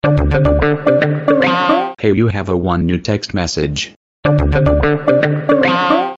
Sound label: Cool txt msg